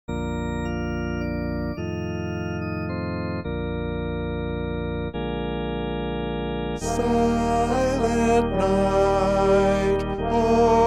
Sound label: Silent Night Tenor